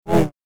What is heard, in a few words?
Double Bladed Saber Sound; FXHome